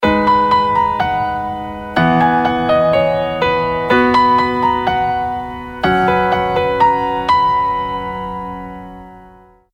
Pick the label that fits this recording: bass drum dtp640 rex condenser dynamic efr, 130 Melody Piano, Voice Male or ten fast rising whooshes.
130 Melody Piano